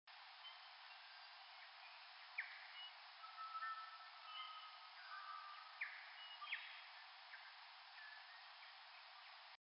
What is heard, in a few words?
recording with background bird song